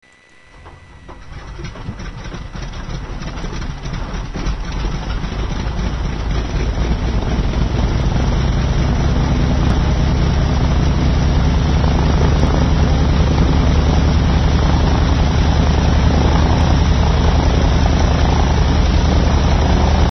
Constellation Start and Idle

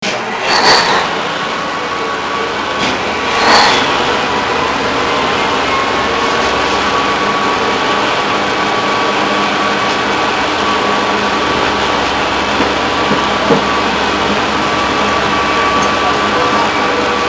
Severn CAT 3412T Engine Start

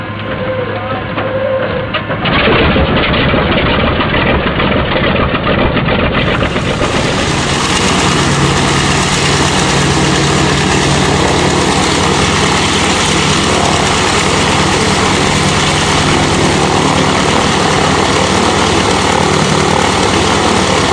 T28 Trojan Start And Idle

